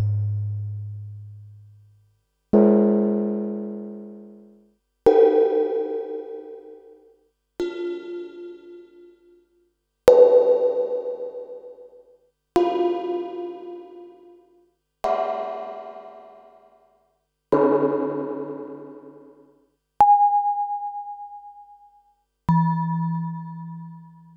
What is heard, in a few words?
Pearl SC-40 Waveforms